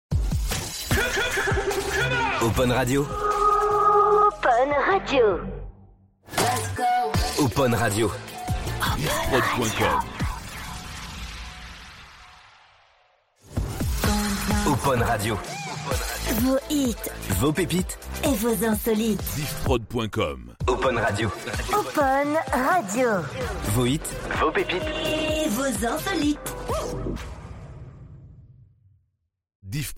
Radio Sweeps ID; Opon Radio

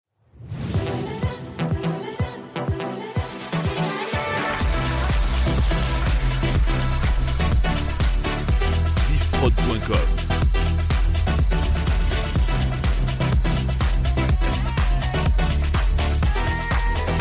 Medley Hits 124 110 Bpm

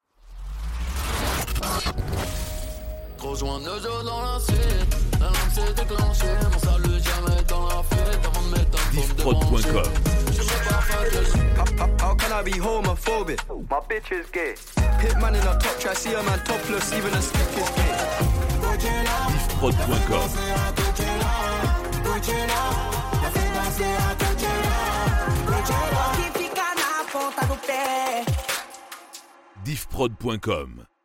Medley Hits Urban Rap 140 Bpm

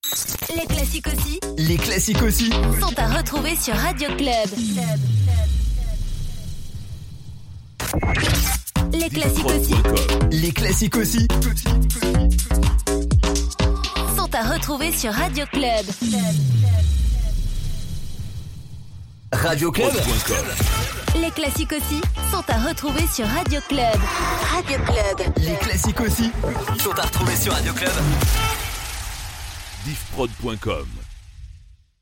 Radio Jingles Electro; Radio Klub

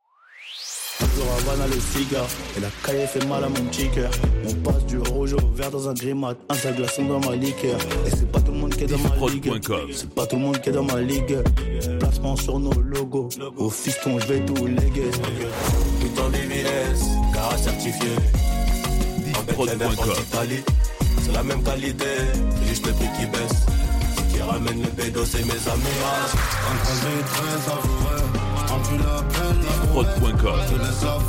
Medley Urban FR

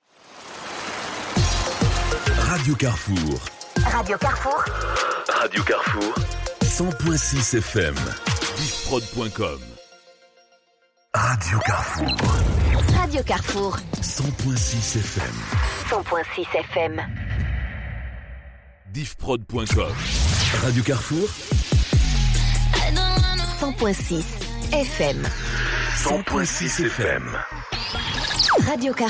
Radio Sweeps ID URBAN; Radio Carrefour

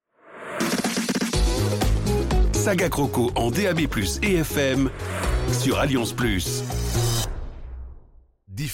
Jingle; SagaCrocos; Alliance Plus

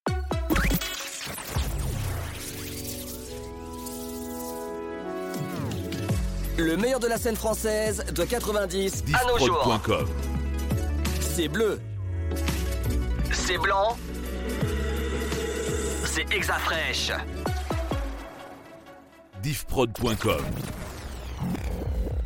Radio Jingles Emissions

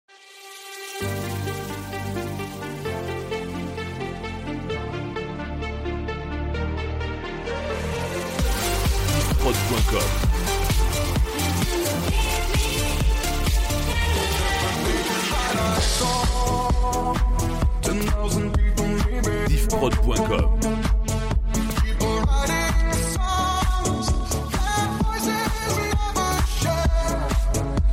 Medley Hits

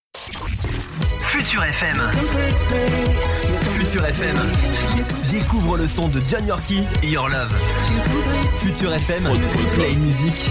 Power Intro; Futur FM

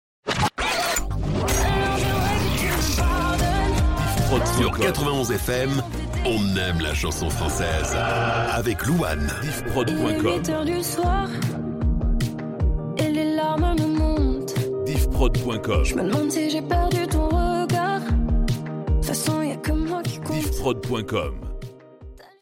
Power intro; 91 FM

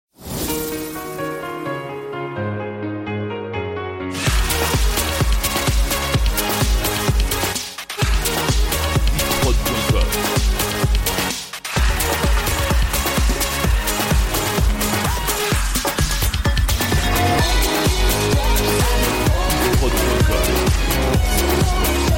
Medley Electro 2010 IDM

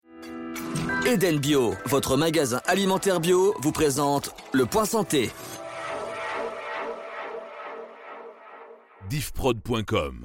Jingle; Esperance FM; Eden Bio

